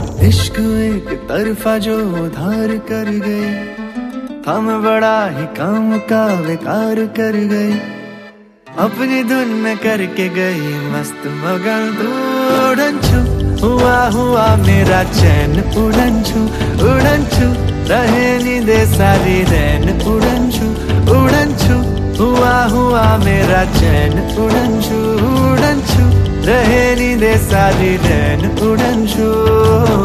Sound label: New Bollywood Song Ringtone